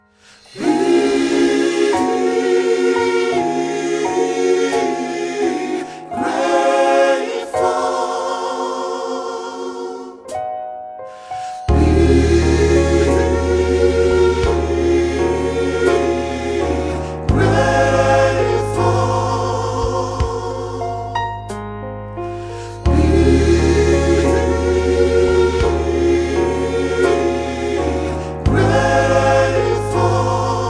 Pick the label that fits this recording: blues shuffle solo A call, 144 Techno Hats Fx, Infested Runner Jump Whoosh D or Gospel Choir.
Gospel Choir